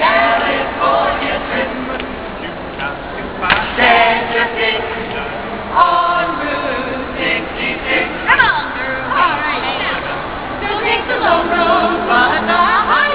funny chorus; Chicago; Illinois; Navy Pier; United States

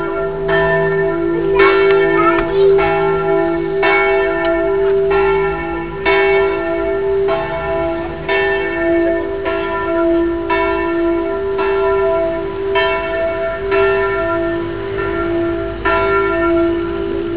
fiesole clock tower; Italy; Tuscany

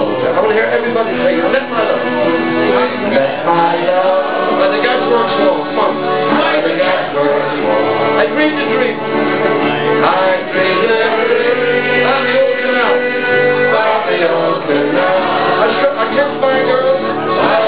pub musicians; Ireland; Connemara; Clifden

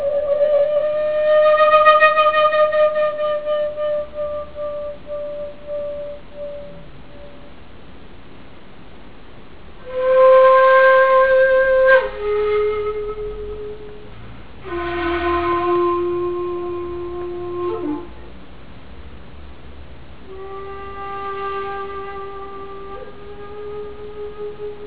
flute player, Japan; People; Asia

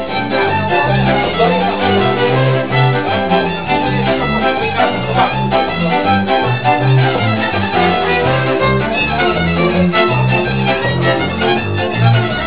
musicians, Zakopane; Poland

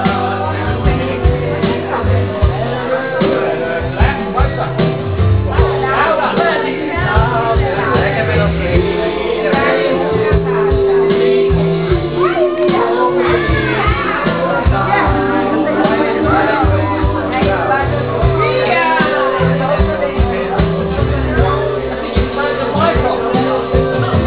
singing; Italy; Dolomites

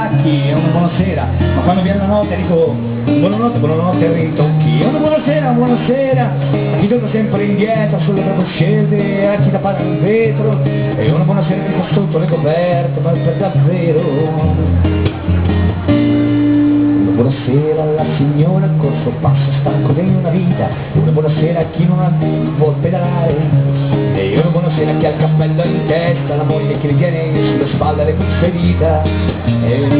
woman playing guitar, Florence, Ponte Vecchio; Tuscany; Europe; Italy

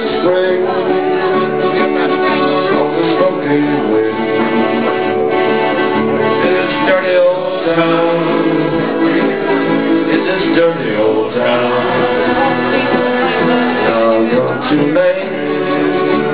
pub musicians; Ireland; Connemara; Clifden

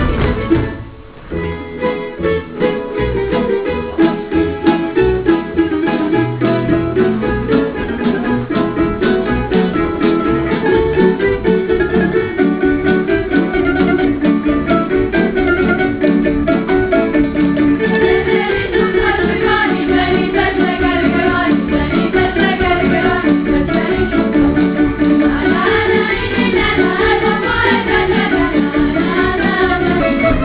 guitar singers, Dubrovnik, FolkDancing, Musicians; Europe; Croatia

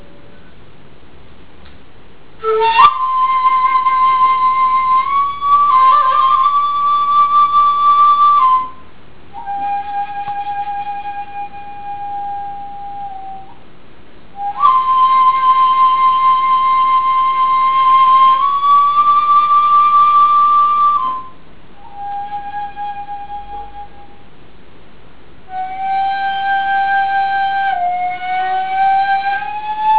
flute player, Japan; People; Asia

